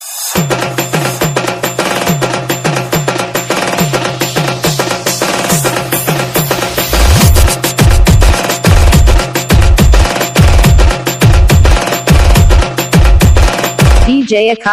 indian trap trance dj ringtone

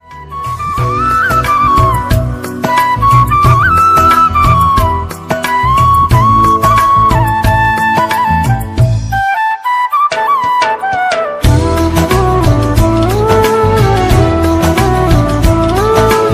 flute ringtone

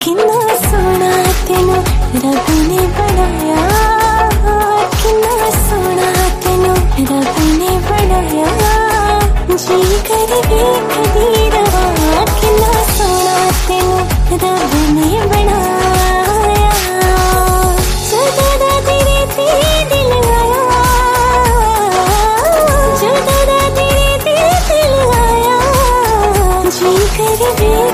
female version ringtone

